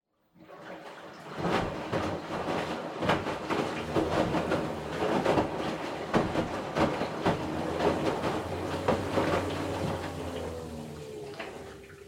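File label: Washer Front Load Operation Noise Wash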